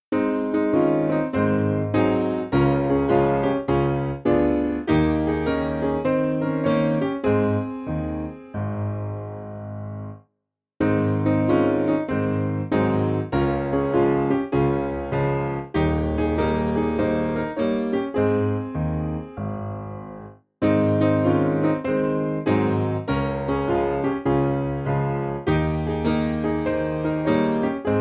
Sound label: Ring The Bells, Piano